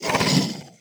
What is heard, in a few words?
Monster Effort Big